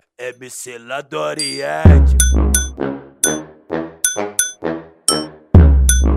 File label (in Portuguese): vinheta